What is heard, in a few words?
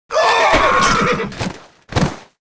Knight Death; warcraft3